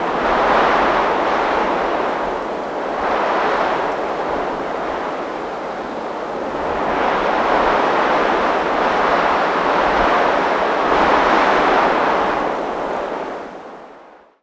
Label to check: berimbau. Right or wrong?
Wrong